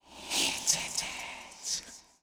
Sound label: Sword idle